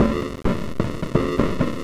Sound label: bongo broken, Deep House, 130bpm